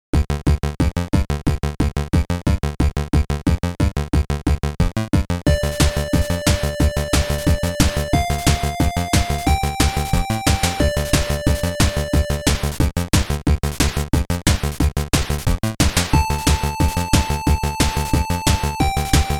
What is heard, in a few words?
Boss Music; project blue original soundtrack